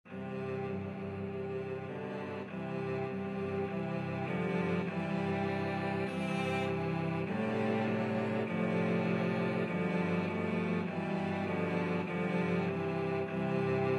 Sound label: cello quartet